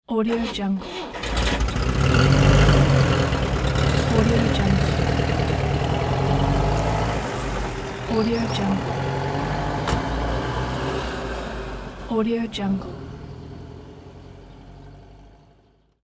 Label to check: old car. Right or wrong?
Right